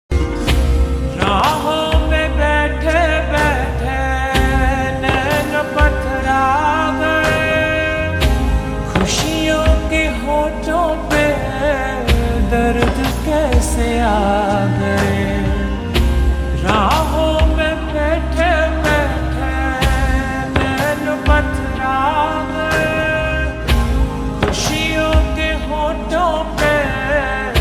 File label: Ringtone Slowed Reverb